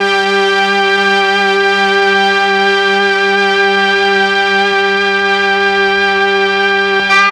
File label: MEL STR, Legendary M400; ILIO; Propeller Island